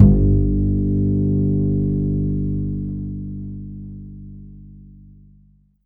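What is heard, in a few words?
double bass G1, Jazzistic; DBASS; Univers Sons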